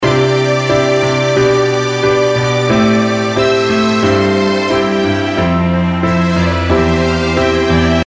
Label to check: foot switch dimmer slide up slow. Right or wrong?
Wrong